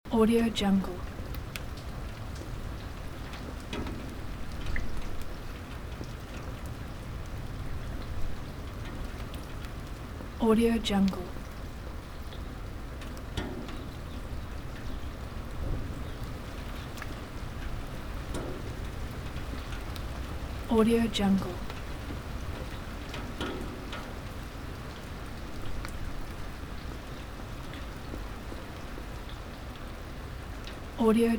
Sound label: rain and wind under metal garage loop; fxprosound